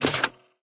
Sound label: Battery Attached To Bar